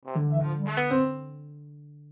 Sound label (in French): do ré mi fa sol la si do (plusieurs instruments); clef de sol